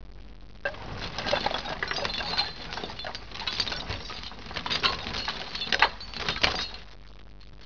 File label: toolchest